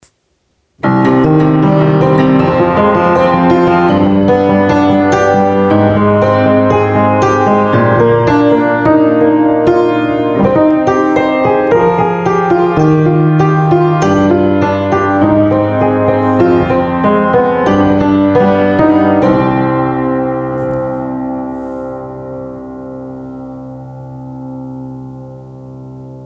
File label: piano riff